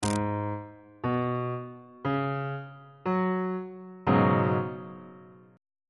c sharp dominant 7th suspended 4th 2nd inversion on bass clef